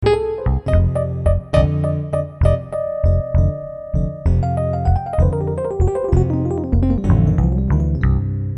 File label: blues